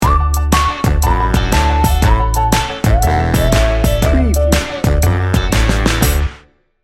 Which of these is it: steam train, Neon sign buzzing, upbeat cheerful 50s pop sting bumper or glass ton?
upbeat cheerful 50s pop sting bumper